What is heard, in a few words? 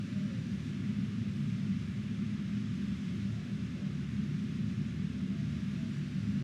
Pipe Emitter